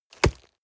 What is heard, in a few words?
nylon leather hit hard dry